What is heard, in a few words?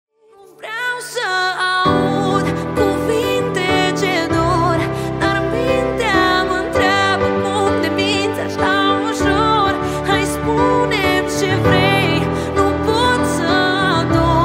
Piano Live Session